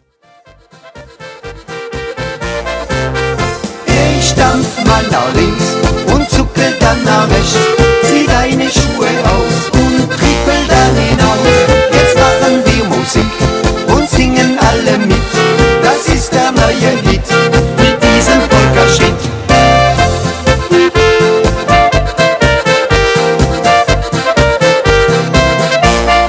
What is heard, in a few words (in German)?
Stampf Polka